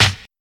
00s noisy snare drum sample g key, Royality free acoustic snare tuned to the G note; snare drum samples